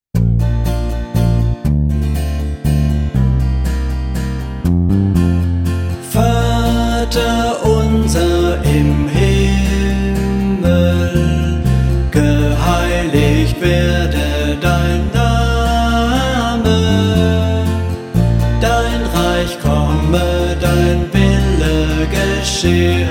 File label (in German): Vater unser Kanon